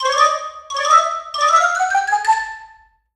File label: Ladder, iPod sounds; i OS